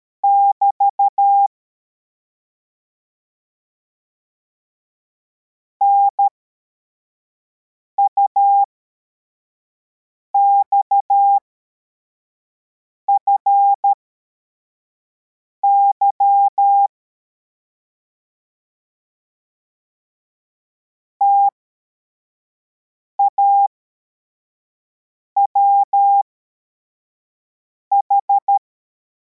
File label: cw, morse